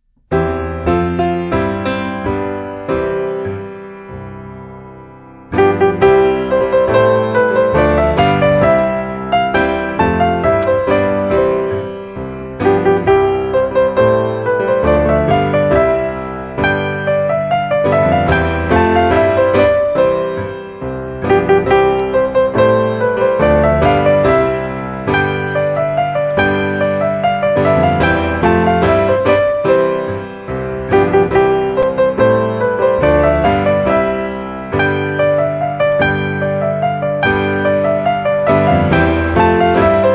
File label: Twelve Days of Christmas, without Jingle Bells